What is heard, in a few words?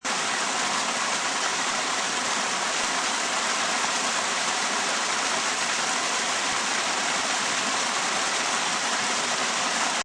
Waterfall Loop Long, Small waterfall, seamlessly looping sound, long version.; Rivers; Nature